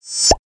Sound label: Diamond Glued